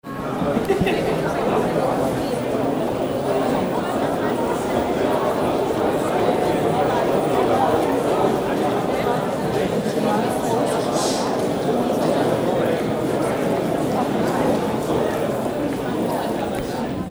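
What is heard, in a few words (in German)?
Gemurmel Menschenmenge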